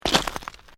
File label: Footsteps Boots Volcanic Rock Step; Footstep Foley; Footsteps (Sound Effects:Human)